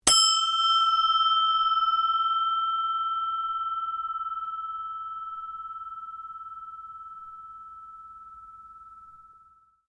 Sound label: Belltree Bell, Small percussion bells; Bells (Sound Effects:Musical)